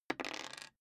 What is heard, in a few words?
dice roll table soft